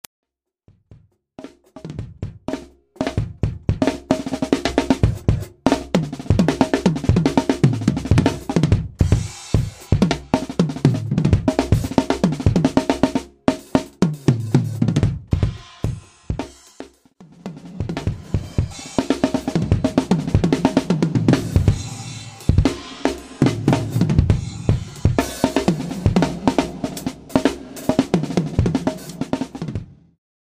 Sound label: Drums Dry And Wet